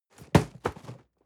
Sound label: cardboard box medium fall high; objekte